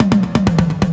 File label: Fill Ins 128BPM; Vengeance Samples